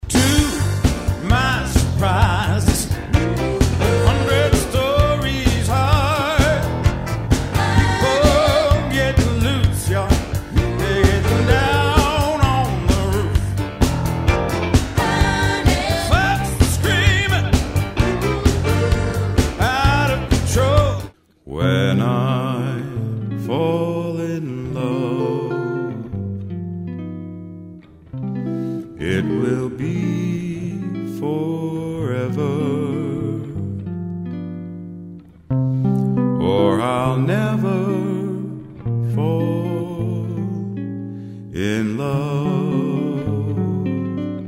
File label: Sing